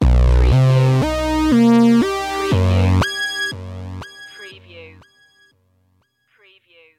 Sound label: Short n Evil